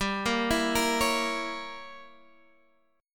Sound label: G Diminished 7th, Guitar, Standard; arpeggiated; capo 2; x,10,8,9,11,9